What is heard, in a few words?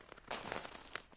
snow, footsteps